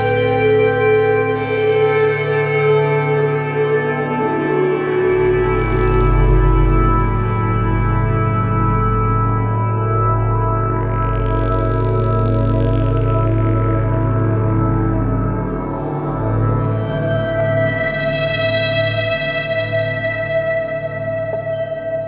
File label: Spawn Music; Warframe; Dark Sector